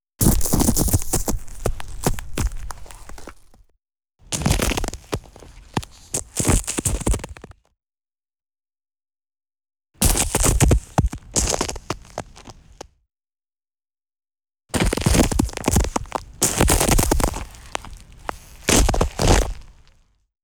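snow samples - stepping and crushing, Georgia winter storm; field recording; Collection of recordings on January 10th 2011 during the Georgia winter stor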